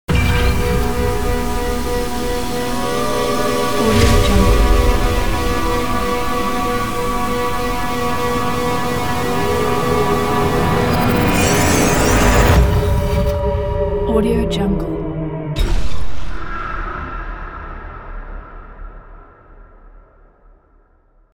cinematic dark mysterious ident

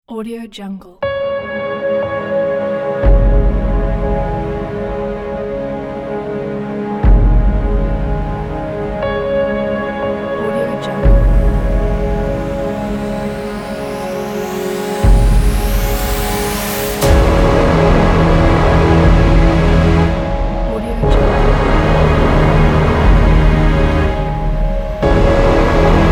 epic breathing hybrid trailer

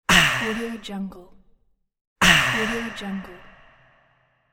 thirsty ahh

